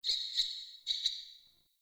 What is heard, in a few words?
Sparrow Death State Song; Animals